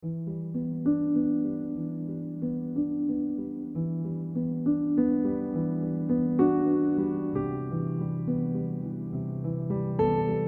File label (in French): musique jeu; Owlet; Jeu-vidéo